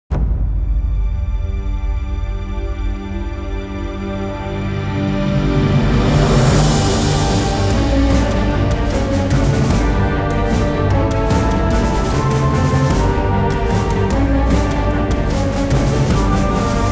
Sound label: Battle Action Music